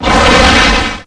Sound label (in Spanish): Agua; RPG Maker; Legend of Heroen v1.20